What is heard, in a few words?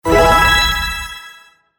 plinko win